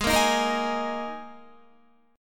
Ab Suspended 2nd Guitar; strummed; JGuitar

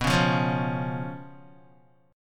B Suspended 2nd Flat 5th B down Guitar; strummed; JGuitar

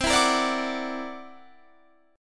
C 9th Sharp 11th C

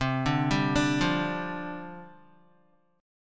C Suspended 2nd Suspended 4th, down, Guitar; Csus2sus4; arpeggiated; JGuitar

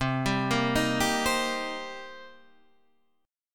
C Minor 7th, Guitar, Half Step Down

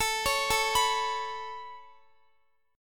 A Major Add 9th, Guitar; Aadd9 arpeggiated; 4F,4A,5C,5G,5C,6E; x,x,21,18,21,19

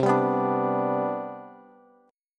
C Major 7th Suspended 2nd C down Bass, chord; CM7sus2 strummed; JGuitar

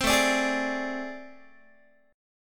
A Minor Major 11th, down, Guitar; AmM11; strummed; 15,17,17,18,18,x

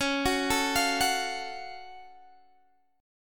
F sharp Minor Major 7th

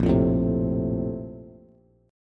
Bb Major 7th Suspended 2nd, Bass; BbM7sus2 strummed; JGuitar

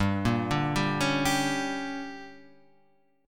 D Major 7th Suspended 4th Sharp 5th, chord

